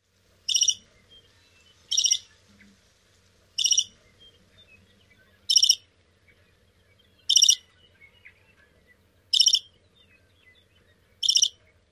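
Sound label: song, bird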